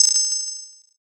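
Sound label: bell; suzu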